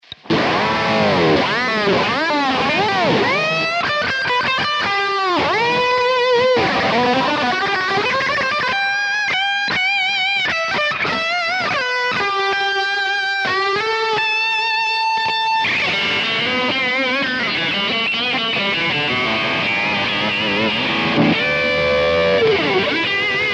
Carl Martin PlexiRanger Plexi plus Boost More Gain